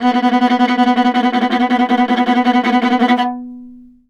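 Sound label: VIOLA tremolo B2; Orchestral; DirectWave; Varazdin Orchestral; Viola II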